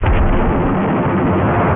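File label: flamethrower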